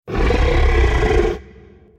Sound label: growl, trex; Crossy Road; dinosaurs